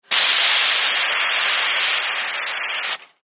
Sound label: Close Steam Blast, steam